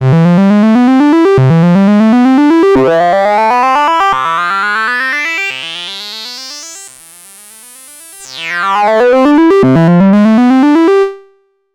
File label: 12dB SVF 12dB HPF Sweep Dist; GRP Module